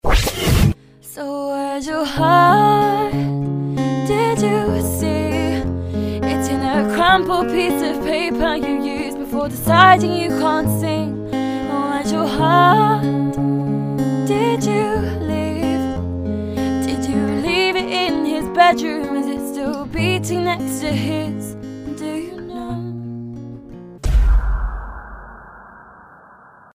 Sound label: FX Backwards Girl